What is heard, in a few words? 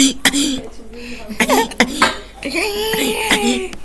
pant